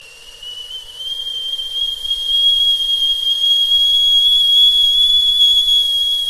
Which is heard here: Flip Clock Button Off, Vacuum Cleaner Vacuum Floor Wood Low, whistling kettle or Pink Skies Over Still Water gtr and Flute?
whistling kettle